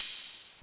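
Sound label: Yamaha RS7000 hihat cymbal, Drum machines; Yamaha RS7000 Cymbals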